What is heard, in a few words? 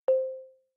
setup step, homepod